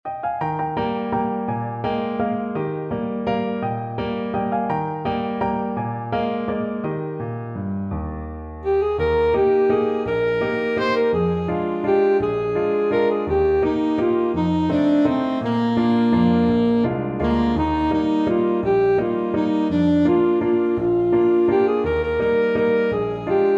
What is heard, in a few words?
ASAX, saxophone